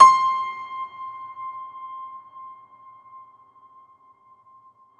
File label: Bosendorfer; Optical Media International - Sonic Images Library